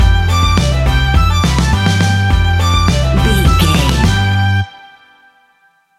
folk country fusion music stinger, Folk country music fusion track. A raw and earthy piece of traditional folk music